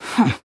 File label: hmph; nagisa